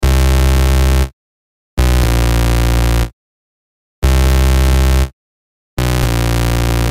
dubstep drum n bass bass; new impression

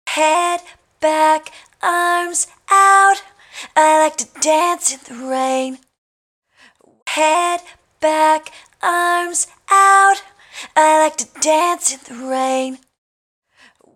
garage band with effect

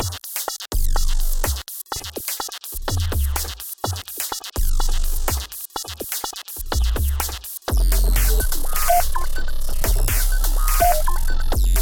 Reaktor Sinebeats2; NI

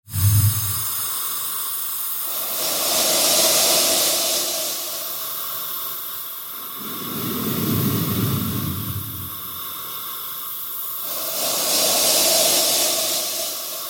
stretched drum beat

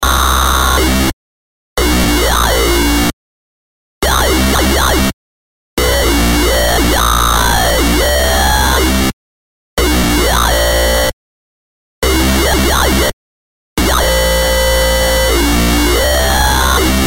dubstep drum n bass bass with redux

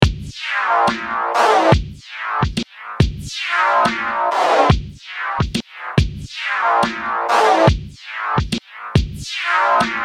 sidechaining example sidechained

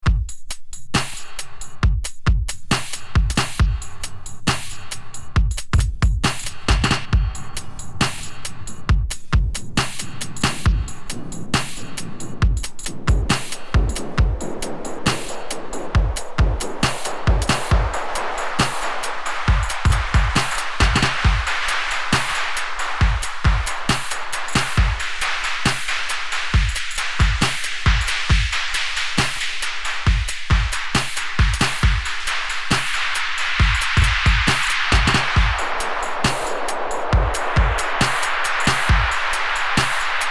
dub delay noise

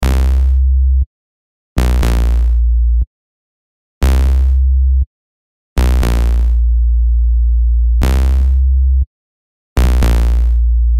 dubstep drum n bass bass; first impression

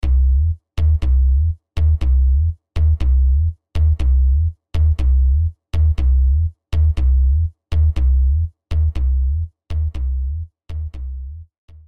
bass overdrive

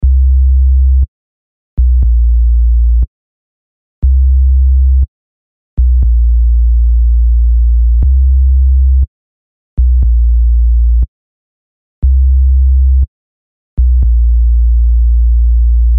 dubstep drum n bass bass, the operator

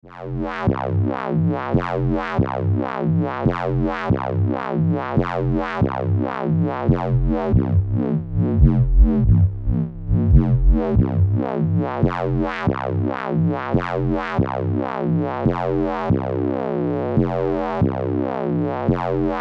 Dubstep Bass Example

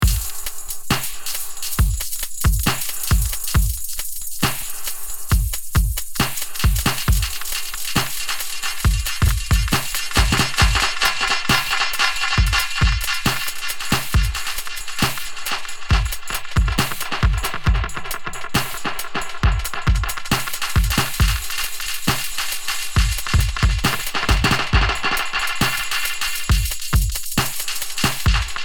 dub delay time

